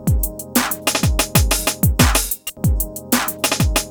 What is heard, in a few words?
BEAT, 93-96bpm; PlatinumPhattCD1; E-MU Producer Series Vol. 8 – Platinum Phatt; Beats